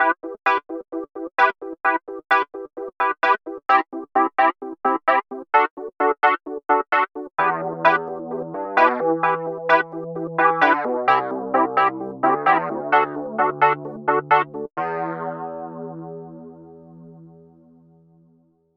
Chorused Wurly, E. Piano; Brunobrgs

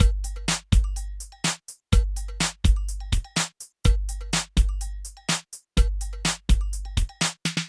pop loop, Yamaha QY10 Drum Samples

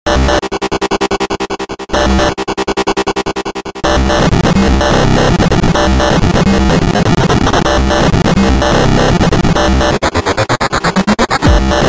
Synth, Rate Switch

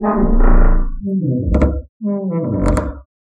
creaky door; Dblue

